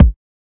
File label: old school small reverb kick drum sound a sharp key